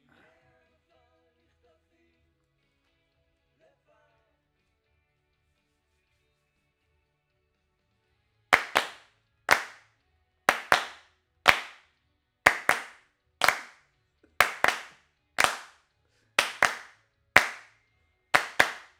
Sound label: Claps